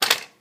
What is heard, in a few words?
open loaded, Tape recorder +2A; Mass storage; zxsp